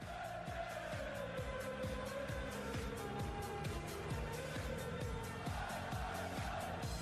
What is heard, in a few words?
game shot low volume